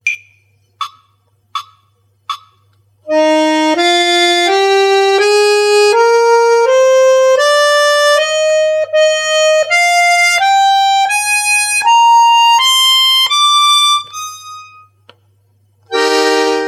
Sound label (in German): die Es Dur Tonleiter und die c Moll Tonleiter harmonisch